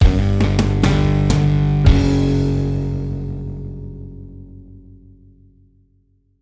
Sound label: Sting Player Down; X-Men Origins Wolverine; NDS